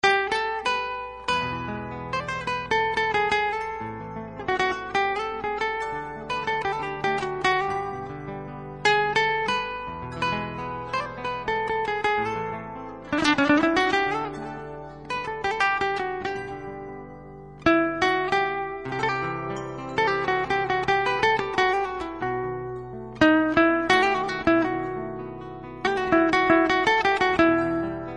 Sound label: sweet classic